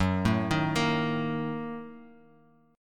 Bb Major 7th Suspended 4th Sharp 5th, Gb, chord

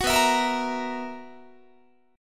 B Major 7th Suspended 4th B, Guitar; strummed; 21,19,19,20,20,21

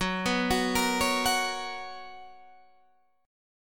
B Major 7th Suspended 2nd F sharp

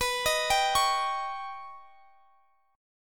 D sharp 7th B, Guitar; D#7/B; arpeggiated; chord shape

